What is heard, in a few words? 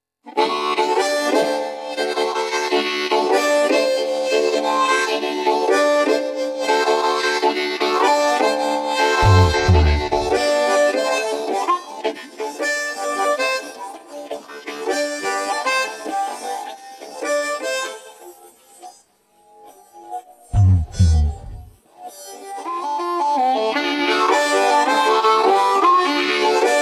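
blues fast solo harmonica no vocals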